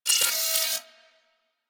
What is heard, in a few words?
Turret Harness Movement